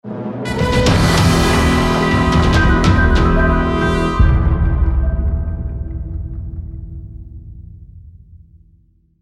Towerfall (Stinger)

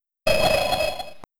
Coin; G-Stream G2020

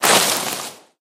Tea party Hedge Break

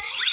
Muzzle Tamp; deer hunter 2 the hunt contunues

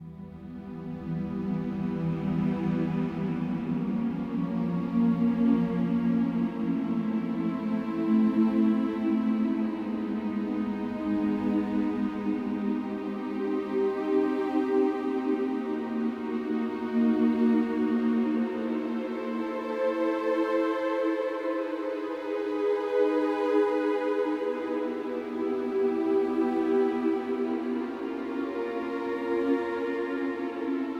Ambient; Mech The Destroyer

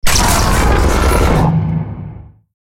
Godzilla Breath Attack; Godzilla Smash3

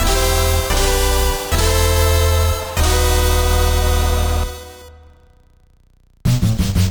music safehouse intro; Skellboy

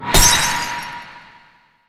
Card Break

